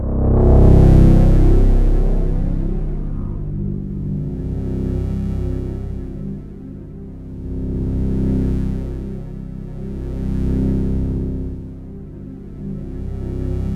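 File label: DEEP ATMOS, Kurzweil K2000; BASIC-KW2000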